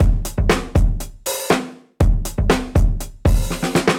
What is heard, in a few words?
Beat 120, dusty funk; musicradar; Beats; 120bpm